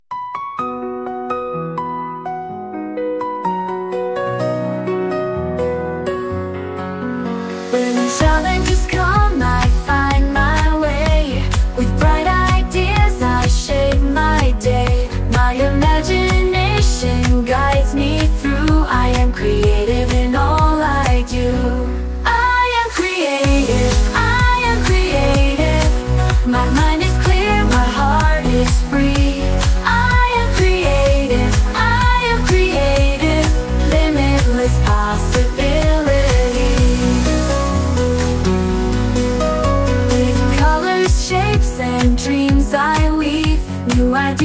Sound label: Vocals